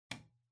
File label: Light Switch Rocker, Wall Gentle